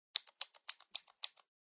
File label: Mechanical Pen Click